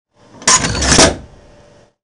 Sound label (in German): zuschneiden